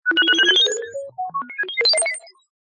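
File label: Science Lab Left Knob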